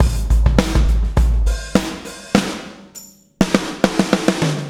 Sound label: BLOW, BLOWUP